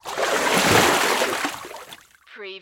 Splash Movement

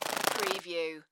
Wings Flapping, Large Insect Shake Wings

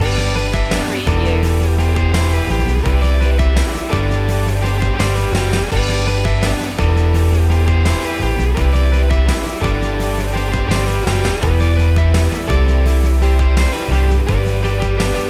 pop anthemic song

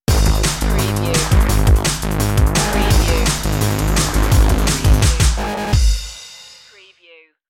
lost in space stinger

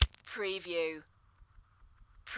Lighter Light Gas Hiss Soft

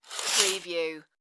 curtain slide

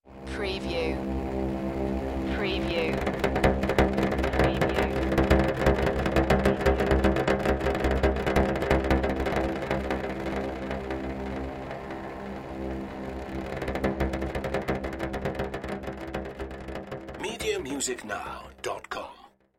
Electric Drop

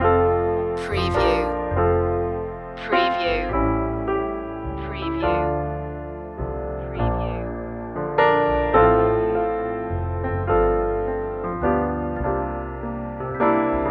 gospel piano loop

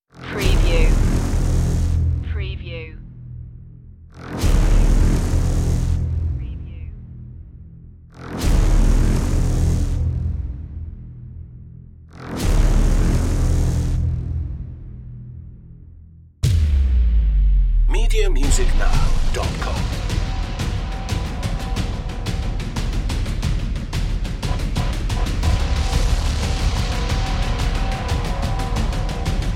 hybrid trailer blares and drums

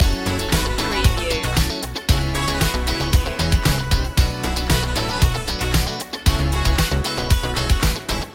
showtime loop

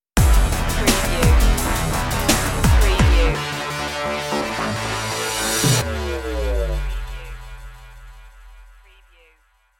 dnb stinger

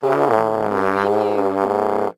Cartoon Trombone

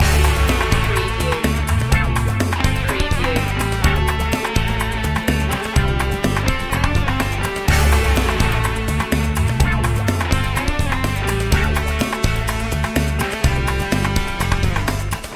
funky cuba loop

